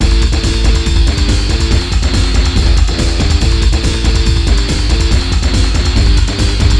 Full metal w-0 vox-; HOUSEJAM

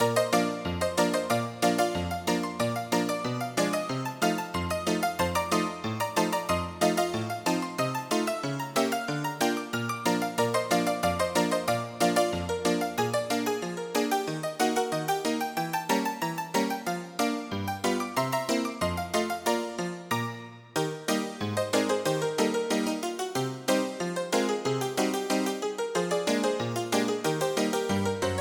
RAGTIME

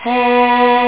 aahh

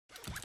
zipper

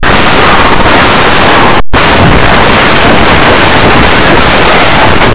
lock

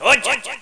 hit; BANZAI

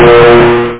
radio; Internet 1996 World Exposition; Japan; Panasonic

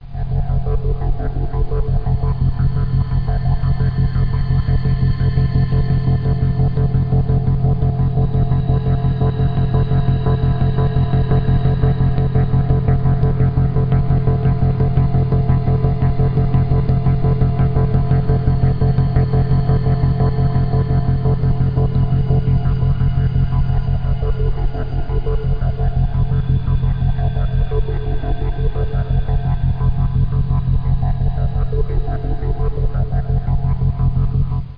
PAD

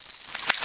Hit; Quake

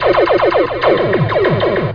Bank Shoot Target, Eight Ball; EightBallDeluxe DEMO

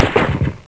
struct hit; Stealth Combat

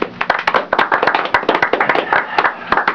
CLAP POLI

